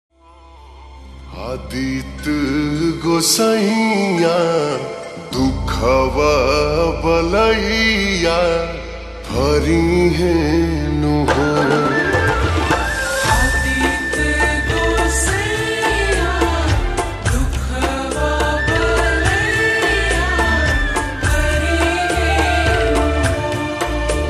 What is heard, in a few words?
Slowed Reverb Ringtone